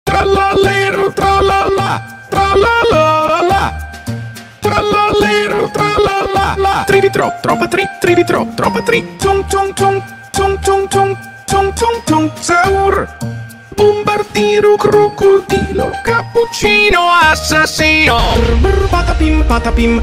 JINGLE BELLS; ITALIAN; christmas

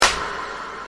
clap anime meme sound

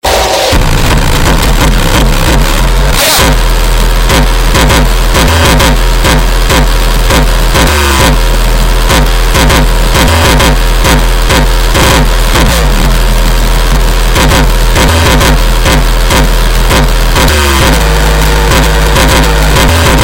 l0ud phonk

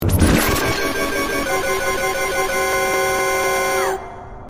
laugh; fatal error; sonic

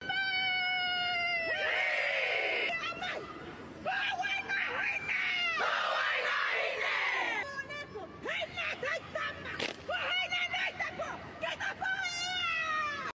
Traditional Maori Haka meme sound

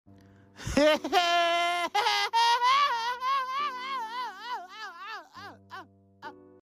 Chinese throat singing

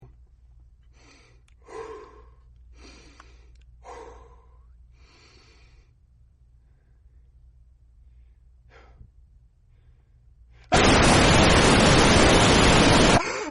my longest scream; made with Voicemod

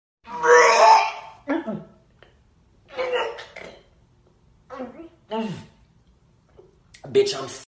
gagging noises; rickey thompson

